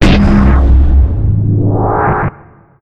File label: Sci Fi Sniper